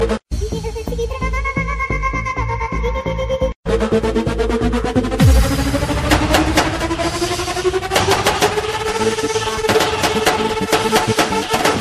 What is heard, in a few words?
Mix Tone